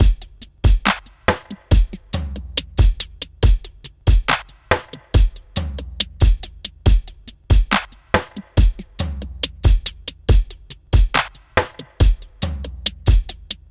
Electronic, Drums & Percussion; Sonic Foundry (Sony Creative Software) - Electronic Point Blank

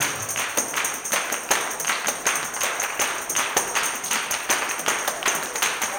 160 TAMB BRK; Blues & Soul

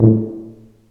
TUBA C0, Solo Brass, stacc; Roland LCDP12

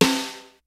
JAZZ KIT; DRUMS; Kid Nepro; Elite

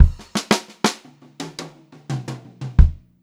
87 STR FILL, Funk-Groove Drumloops; USB Soundscan vol.38; AKAI

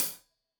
Hat Tight, Hi-Hat; Deeply Sampled Drums

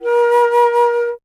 Alto Flute

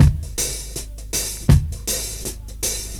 HAT, HI HAT LOOPS, Dub; PERC LOOPS; Drumdrops In Dub VOL-1

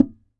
SAZ TAP; Spectrasonics; Hans Zimmer Guitars VOL-2

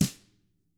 TAMA; ILIO; Double Platinum Drums 1

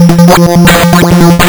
lsdj waves; wavetables

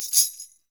PERC, Percussionworks; Sampleheads; NYC Percussionworks; 90 s Sample CDs

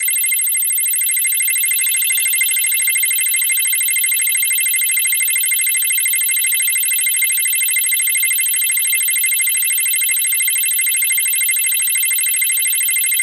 f maj bleep bloop arp

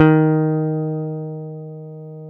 D#2 JAZZ GTR; Univers Sons; Jazzistic

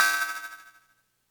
Cymbals, Elektron MachineDrum

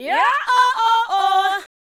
Fem Vocal 128bpm G FX, African Voices; Quazibeat; African Secret; Female FX

